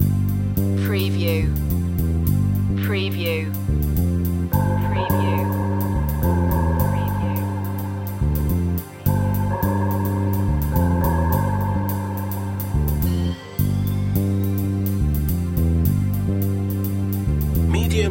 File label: drone maker loop